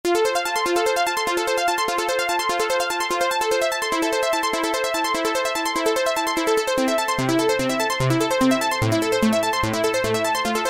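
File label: Sequence Analog SQ; Factory presets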